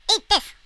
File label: fire; wormux